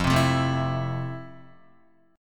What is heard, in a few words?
F Major 7th Guitar; strummed; chord; FM7; JGuitar